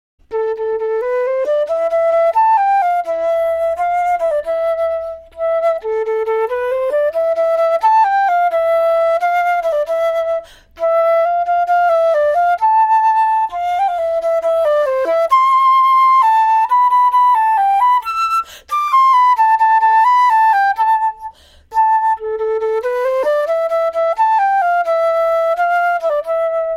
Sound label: Waltz Flute; NRB Waltz